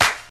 old school hip hop clap sound f key, Royality free clap single hit tuned to the F note